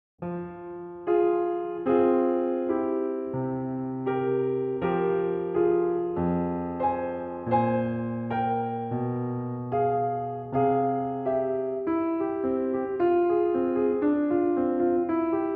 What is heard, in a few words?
Prelude No. 12 in F Minor; Well-Tempered Clavier, Book II; BWV 881